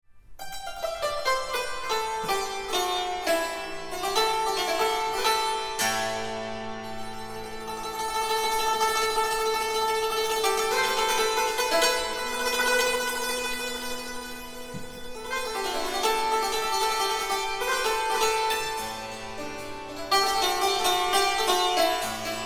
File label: santur